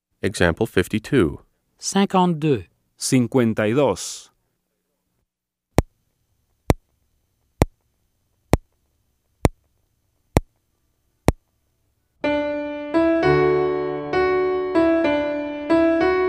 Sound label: Keyboard